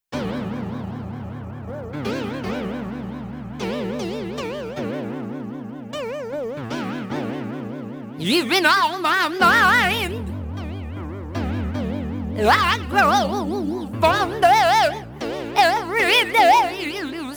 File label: music vib